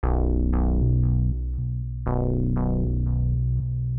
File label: Bass Loop (120BPM); cinematic drama; Loops; musicradar